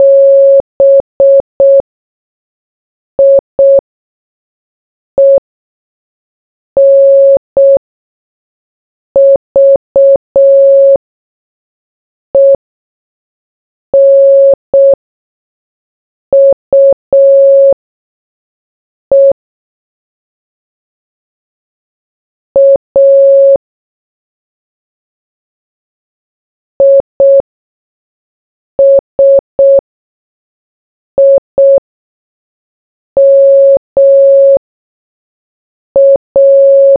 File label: morse